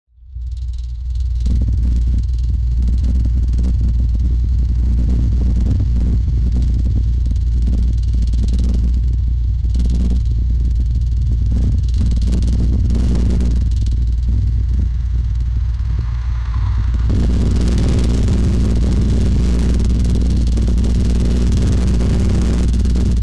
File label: firebird with glass packs, car sounds